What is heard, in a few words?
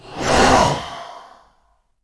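attack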